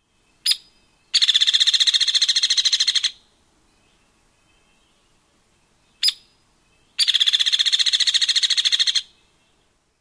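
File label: tweek and sputters, hairy woodpecker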